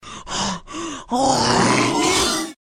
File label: Sleepy Monster; blindside